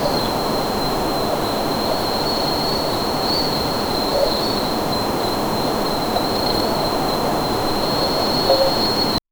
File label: crickets beginning to chi